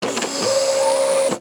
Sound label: scuff